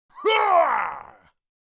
Orc creature long shriek during an attack

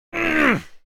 Frustrated male grunt; scream

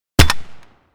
12 gauge single barrel shotgun fire

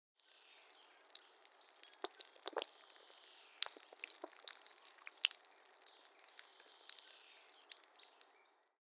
Human peeing urinating outside nature

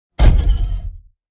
Metal wires subtle impact

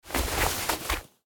Trousers rustling clothes taking off; clothing

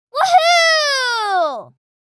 Excited vocalization girl kid child

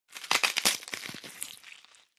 Bones break juicy and disgusting

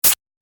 Snake spit venom

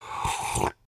Person drinking through straw slurp

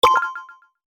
Online item purchase e commerce

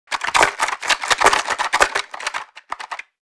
Shaking small box board game contents

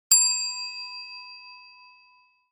Bell notification

